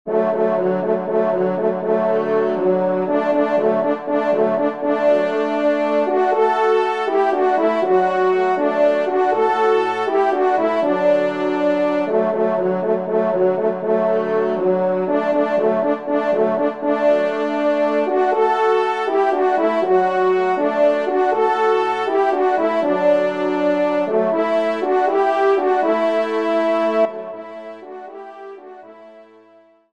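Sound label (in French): ensemble, trompe cors; En Goguette; Emile Rolle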